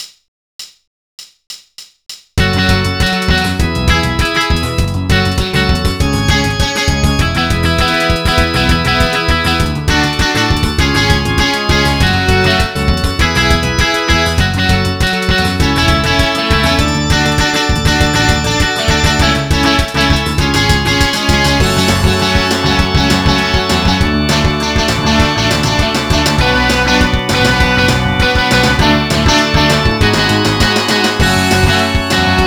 BGM Thunder And Lightning; Imagine Music Fest; 2009 NDS gamerip

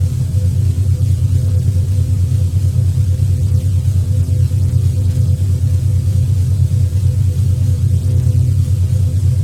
Speed Boost Loop

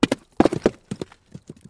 rock

